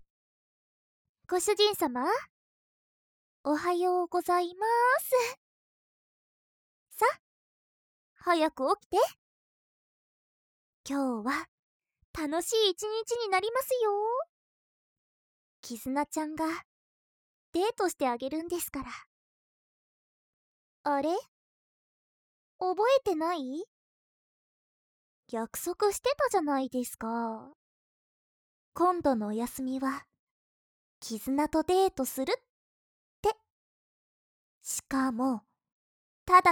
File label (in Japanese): おしっこ; ごあいさつ